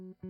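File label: guitar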